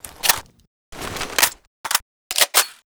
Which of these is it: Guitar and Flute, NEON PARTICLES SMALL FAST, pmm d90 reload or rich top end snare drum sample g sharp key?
pmm d90 reload